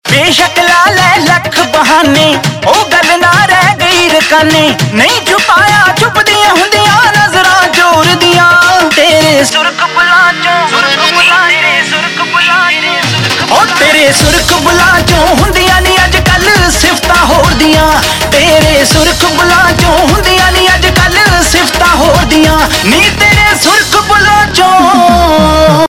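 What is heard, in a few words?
Ringtone, Punjabi Pop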